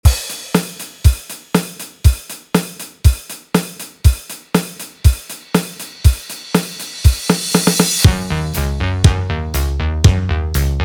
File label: 120bpm, Intro Outro